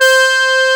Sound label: BANDONEON